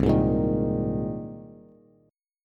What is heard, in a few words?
C Minor 7th Sharp 5th C Bass; Cm7#5 strummed; JGuitar